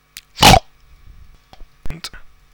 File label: pig grunt